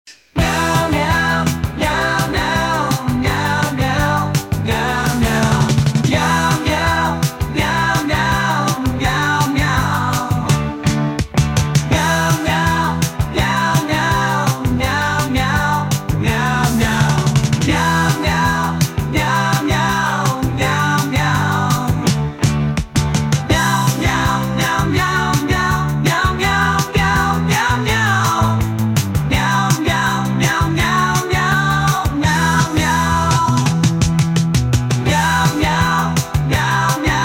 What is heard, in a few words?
Meow Mersey Beat; MusicBoxAI